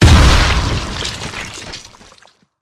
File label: Zombie Explode; criminality